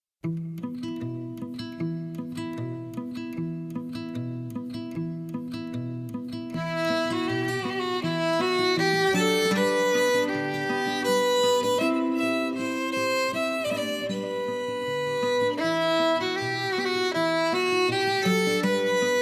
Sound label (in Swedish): Menuett